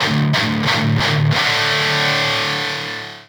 Solo Win

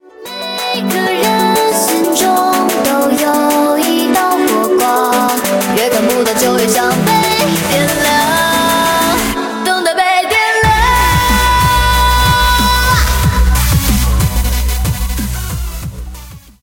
BGM xfade; Invaxion

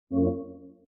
Cancel Back

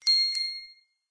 Super Power Hint; cut the rope time travel; android

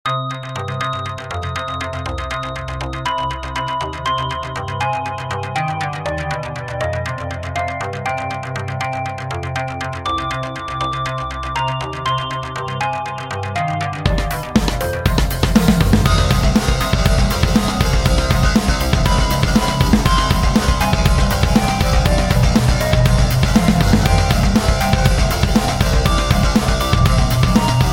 Creepy Rock; Streets of Rage Remake

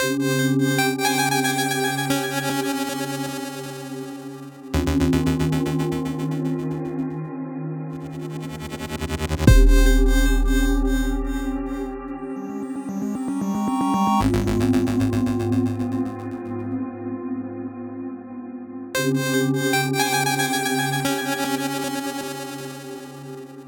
mood ominous

